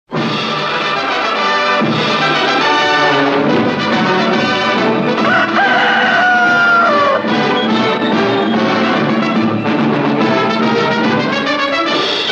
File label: News Fanfare; Pathe News Fanfare; Ren & Stimpy - Production Music